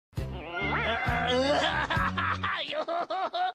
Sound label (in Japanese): mokkori warai